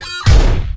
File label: menu; baseq2